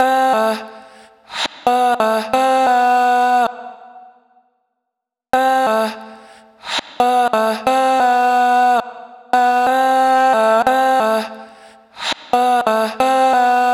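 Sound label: vocal chop, 90 bpm, Am; Ditto; Slate Digital Omnivox Vocal Sample Pack; Chops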